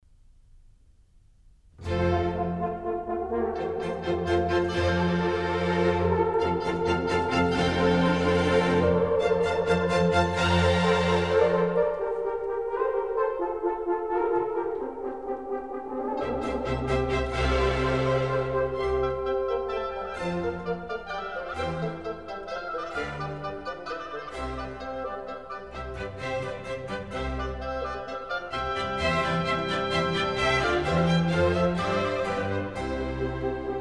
Water Music Suite

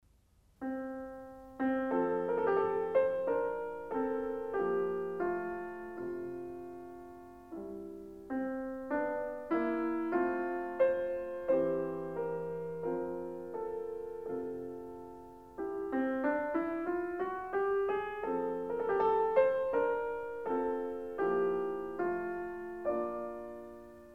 Piano Sonata No. 1 in F minor; Wilhelm Kempff; Beethoven; Piano Sonatas

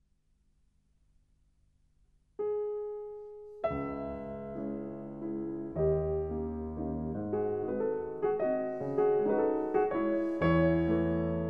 Waltz No. 7 in C sharp minor; Chopin

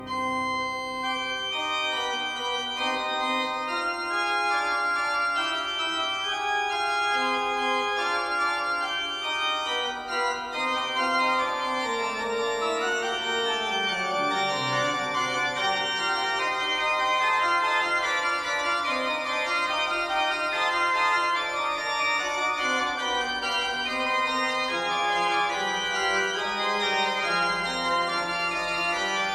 Passacaglia in C minor - II. Fugue, Great Organ; Bach; Bach Great Organ Works; Johann Sebastian Bach

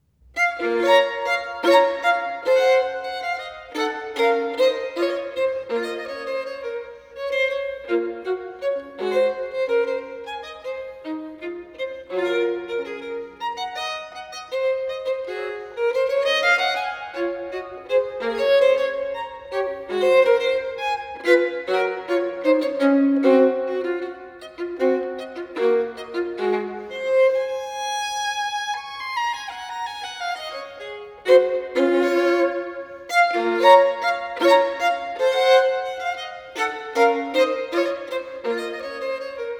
Partita in B minor, Violin Solo; Partita nr.1; BWV 1002; Bach; Sonatas & Partitas for Violin Solo

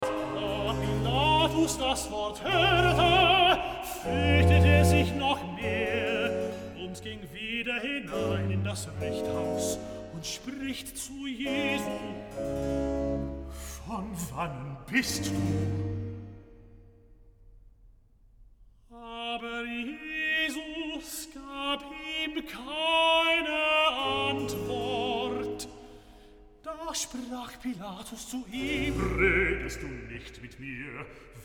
Recitative; Bach, J S; Johannes-Passion, BWV 245, Pt. 2; XIX. Recitative. Da Pilatus das Wort horete, fur

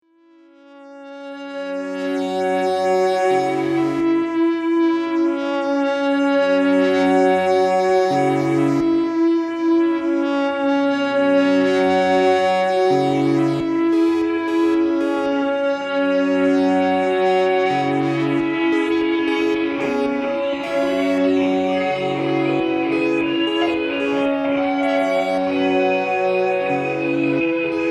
Loop, Solo; national art center ottawa 13 august 1979

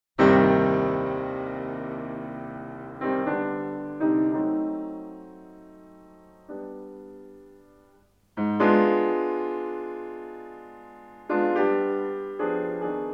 Piano Sonata No. 8 in C minor; Wilhelm Kempff; Beethoven; Piano Sonatas

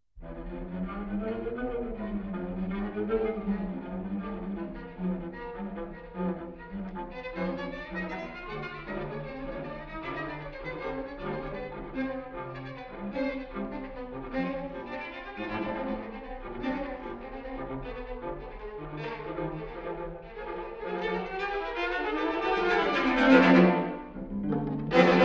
Quartet C Major, String Quartets; Béla Bartók

